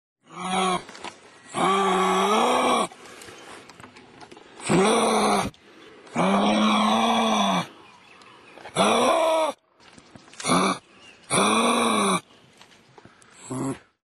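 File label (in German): Känguru; zootiere erkennen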